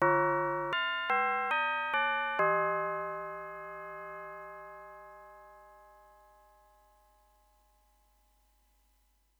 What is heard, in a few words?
ditty; mycon